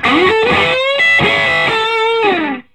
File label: Vintage Blues Guitar, SOLO